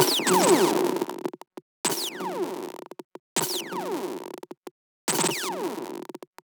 echo laser; fx; base pack

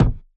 kick; bespoke - Floral v.1; drum oneshots

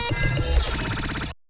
glitch; base pack

